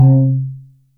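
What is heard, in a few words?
percussion C2, Gamelan, KORG DSS-1; ProSamples vol.55 - Retro Sampler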